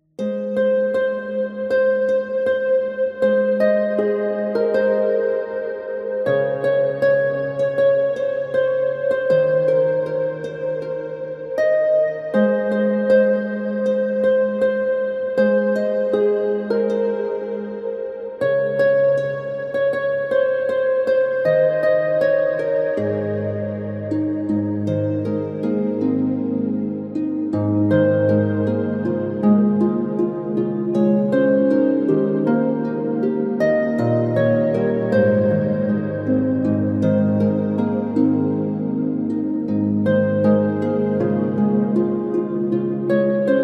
christmas music heavenly harp christmas instrumentals relaxing fireplace; CANTARI